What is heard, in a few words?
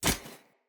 Unarmed Parry Impact; Weapon Impacts; Player; Combat